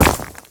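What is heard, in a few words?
evil magic impact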